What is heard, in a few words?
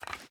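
pistol reload extended mag wiggle; gmod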